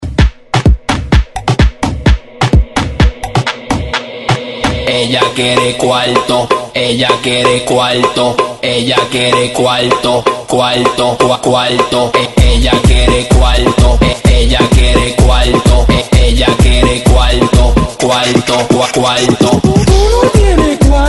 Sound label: 128Bpm